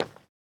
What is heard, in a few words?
Footstep; AudioWizards